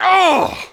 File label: Male Hurt; Mars Sortie